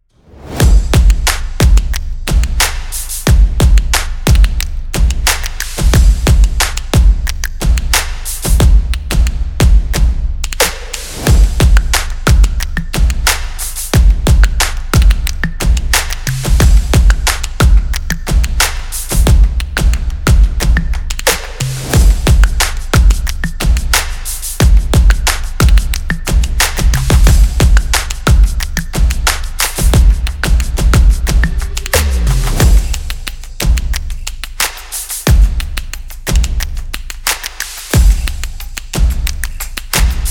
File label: Rhythmic Intro